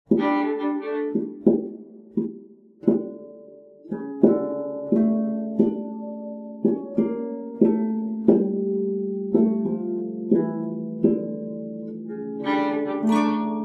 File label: Violin and Harp Tango; Six Pieces for Violin and Harp